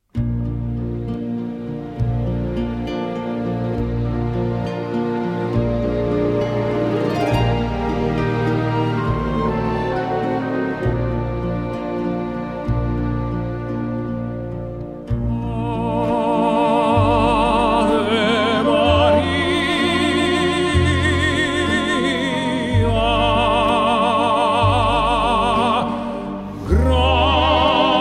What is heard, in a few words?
Ave Maria, For Voice, Violin and Orchest; Schubert; D. 839; Arr. Garrett Van der Heijden